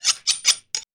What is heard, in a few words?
blade sharpen